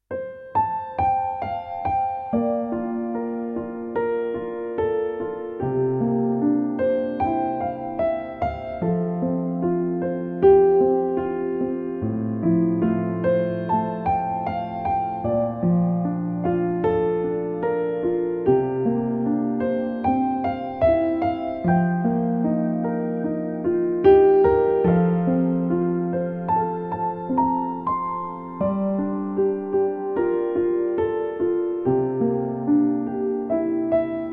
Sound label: Relaxing Piano Music